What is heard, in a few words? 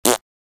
fart; rutti e scoregge